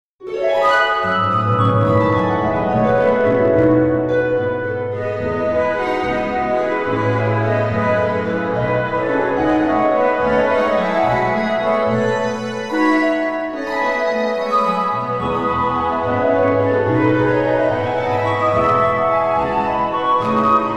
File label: Concertino Flute Harp